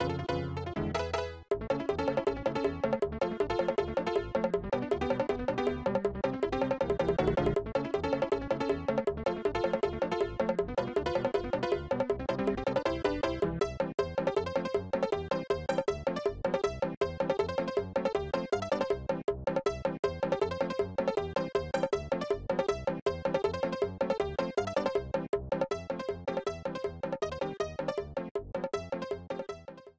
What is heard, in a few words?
Athletic BGM; SMW; Yoshi